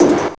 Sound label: SD, Drum & Bass, 170 BPM; Ueberschall; Drum & Bass Flash Trackz